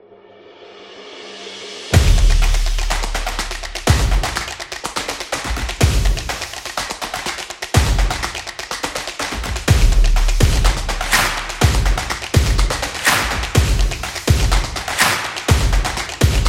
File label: Percussion